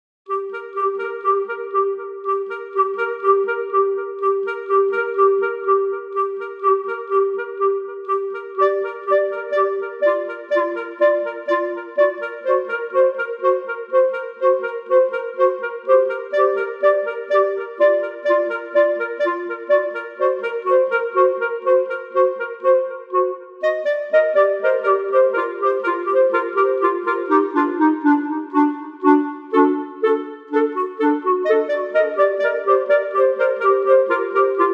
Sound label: Clarinets